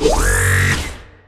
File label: normal hit clap; osu